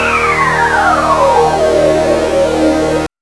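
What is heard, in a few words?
SYNSWP, synth sweep; X-Static Goldmine 1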